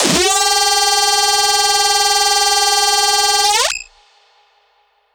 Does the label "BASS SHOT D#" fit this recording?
Yes